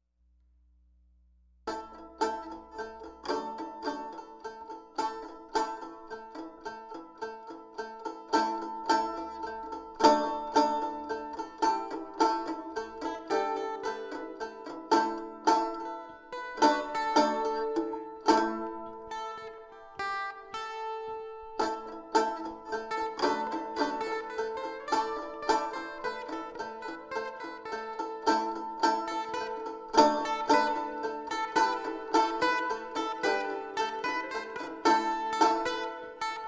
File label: A minor in 3 Banjo